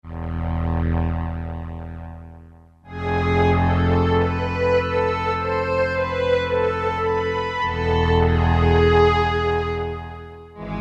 SLOSTRNG, Kawai SX-240

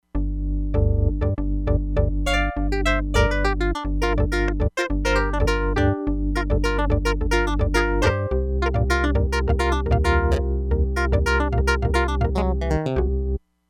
ORGAN CLAVINET, Crumar Bit 99; Factory Programs

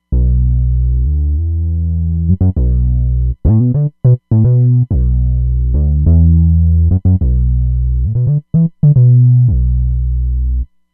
LowFat Bass; Roland Fantom XR

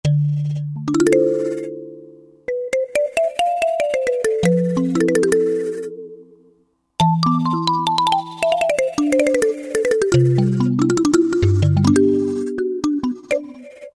Roland JD-990; Book Mark; Factory Patches examples

